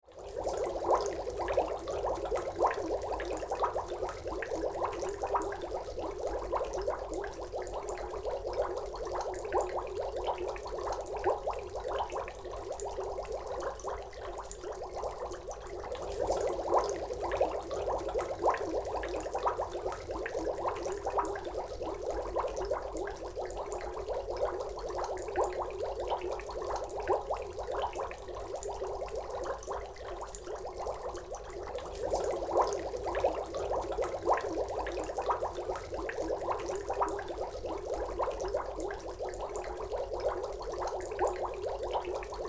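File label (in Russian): puzyri vody, water bubbles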